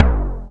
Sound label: Another Tom